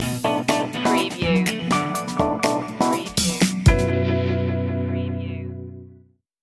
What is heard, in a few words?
tele fusion stinger